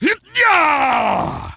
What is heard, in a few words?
Attack Medium; Putress